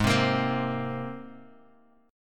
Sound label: G sharp Major Add 9th G sharp, Guitar Open F; G#add9 Chord